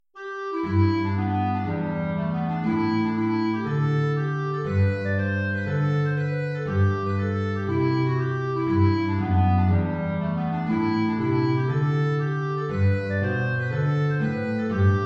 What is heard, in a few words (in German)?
Hornpipe (Klarinette in Bb & Gitarre) (tief); The Belfast Hornpipe